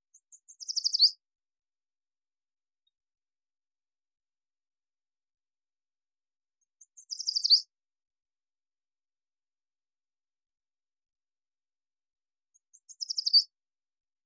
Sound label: Yellow Warbler; Ontario Nature